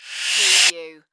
Amb reverse

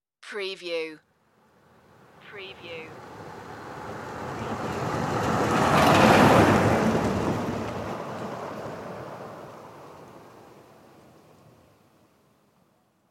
pickup passing

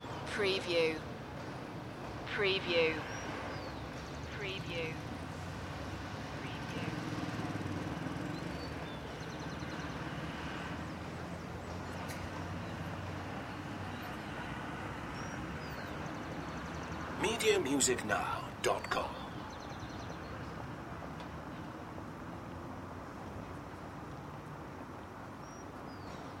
city ambience bogota

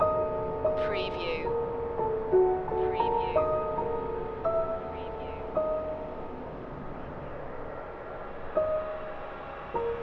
a childs ghost long looping

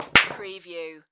Billiards Ball Hit; Sports & Games / Billiards, Pool & Snooker; sound-effects-bible

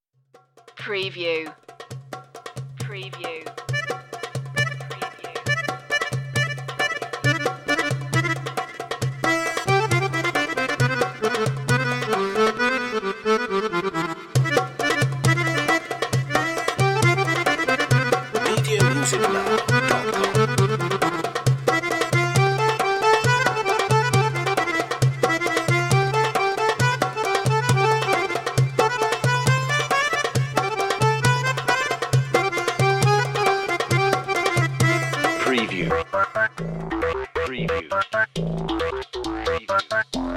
dance party oriental soft

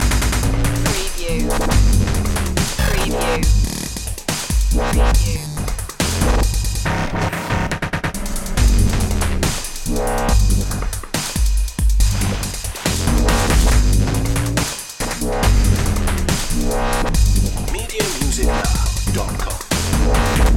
ice ragga loop

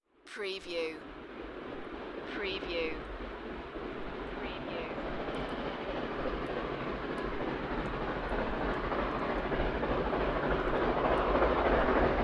Distant snowdonia steam railway; beanstalk audio

